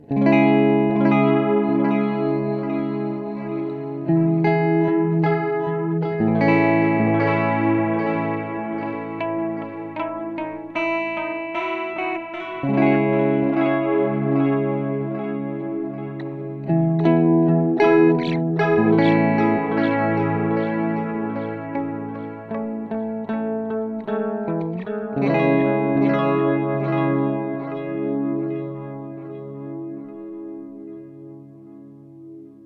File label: Modulation Chorus; Free the Tone Future Factory